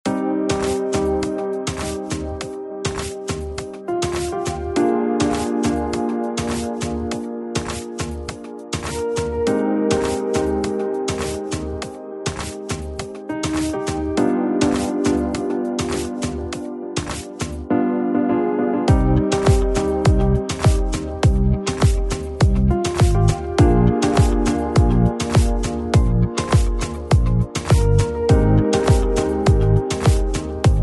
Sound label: urban